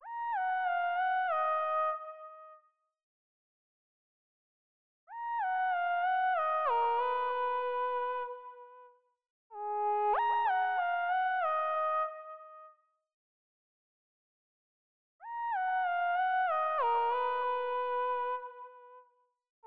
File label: 95 BPM D# Min Lead; Dope